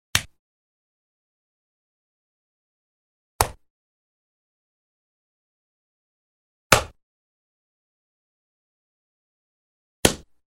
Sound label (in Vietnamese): Tieng ngon tay bung vao tam van go